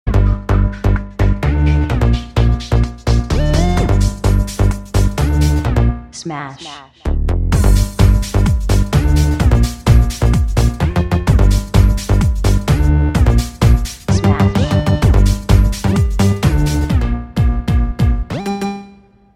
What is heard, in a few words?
Nice beat ringtone